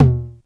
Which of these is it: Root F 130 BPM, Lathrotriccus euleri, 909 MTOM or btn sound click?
909 MTOM